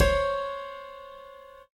Band Hit; Ed Edd n Eddy; Adromulus Pack

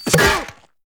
Spit Out and Hit; Ed Edd n Eddy; Adromulus Pack; EEnE

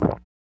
Swallow; Ed Edd n Eddy; Adromulus Pack; EEnE

